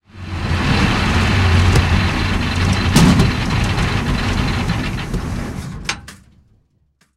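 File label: panzer6 engine interior stop, tanks; War Thunder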